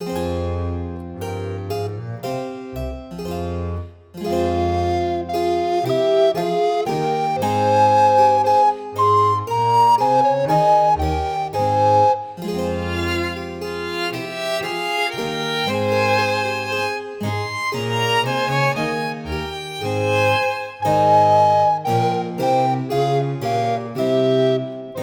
Pavane und Galliarde

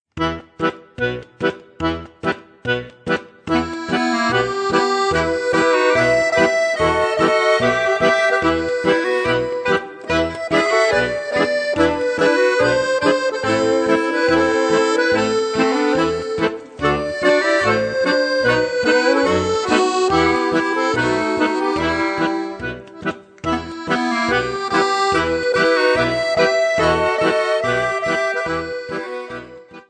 jiddisch, Accordion; Awiglid

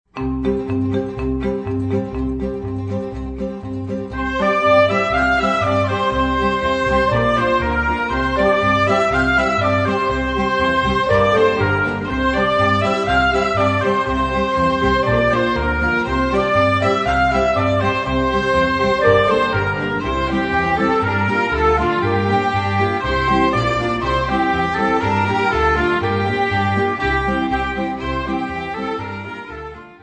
Bulgarien, Accordion; Irinka

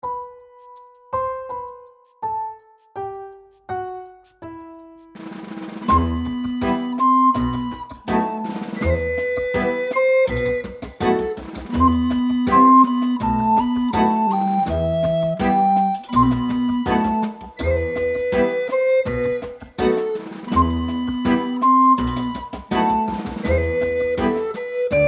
Dialog, Floetentoene; Jede Menge Floetentoene 1

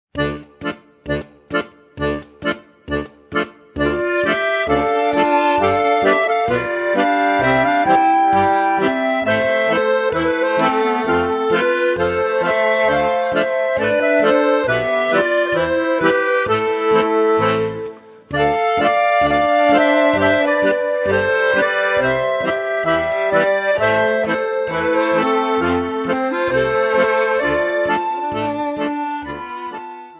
Russland, Accordion; Posadila rozu

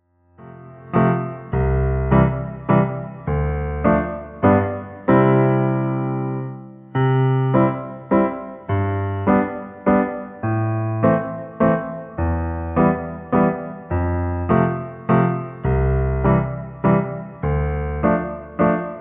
Menuett Playalong, Viola; Fiedel Max 2 Viola

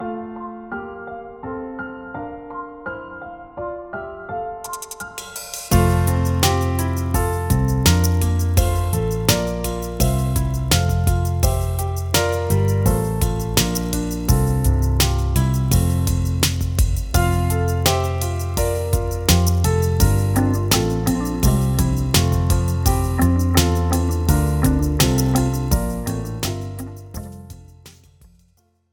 Die Tonleiter F-Dur

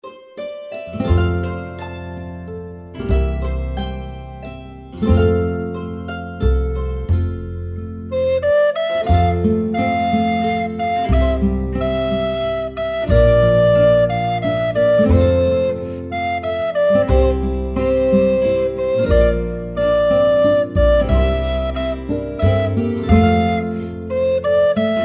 Stubenmusik, Altblockfloete; Schule fuer Altblockfloete 1

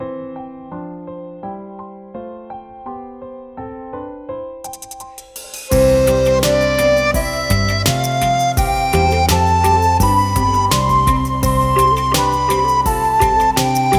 Tonleitern

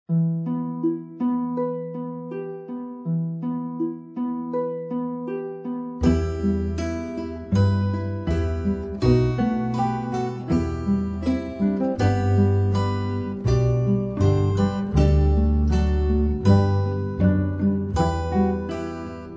Estland, Accordion; Aus dem Pahlenschen Estland

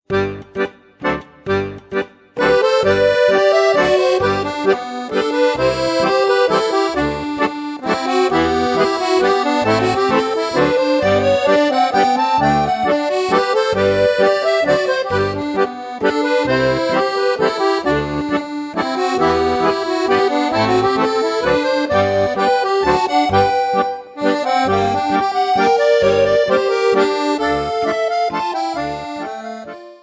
Irland, Accordion; Across The Divide

